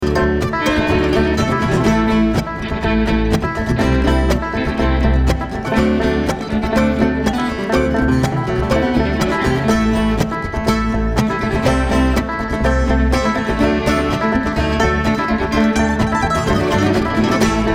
Traffic Jam

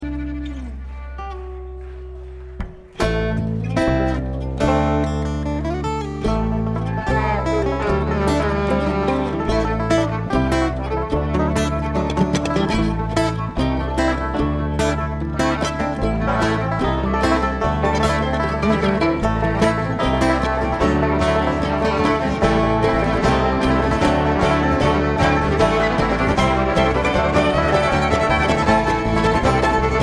Traffic Jam

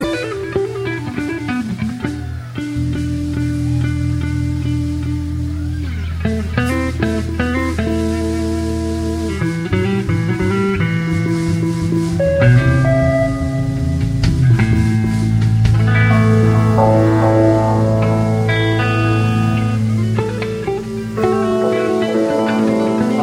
Tuning

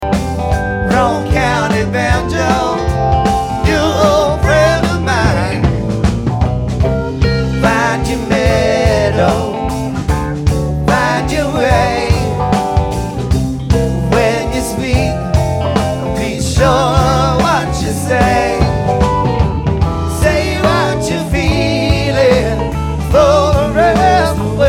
Banjo

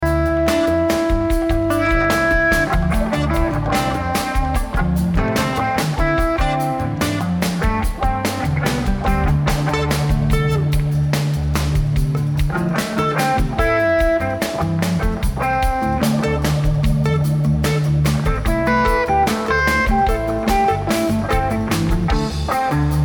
Jam

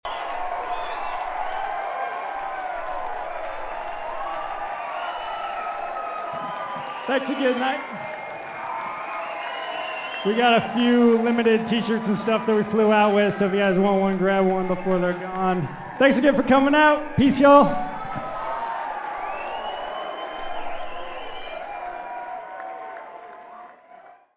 Banter Crowd

